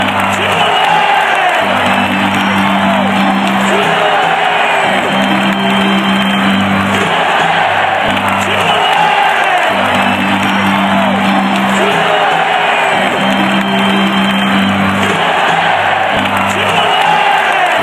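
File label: chili, football chants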